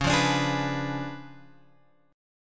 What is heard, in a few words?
Db 7th Flat 9th Db, down, Guitar Open F; Db7b9; strummed